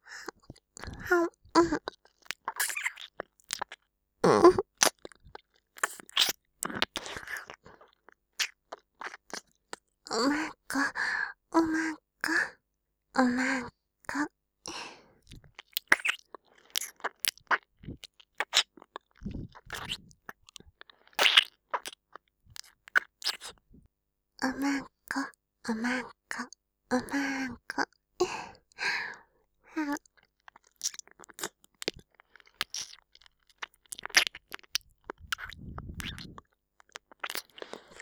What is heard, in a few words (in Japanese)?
耳舐め; お屋敷メイドのハーレム淫語ヘヴン 気が済むまで耳舐め淫語を堪能できるバイノーラルオナサポ音声